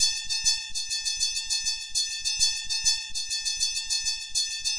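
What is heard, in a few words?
mosquito cycle, 100 bpm; SYNTH AND ELECTRONIC LOOPS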